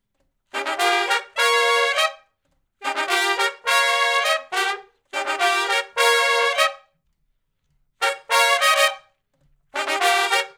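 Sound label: R&B Riff (Eb) hi harmony; Sections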